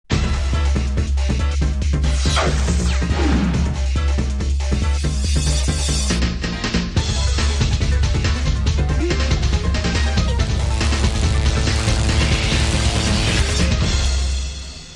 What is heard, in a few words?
stinger